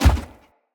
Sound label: Heavy Grunt Boot Walk Default, Footsteps; Enemy; Sinecure